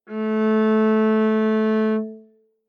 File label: Viola arco ff sul C A3 stereo; timbre